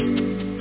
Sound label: string